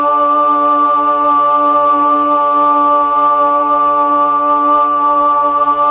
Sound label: Mellotron Female Choir D2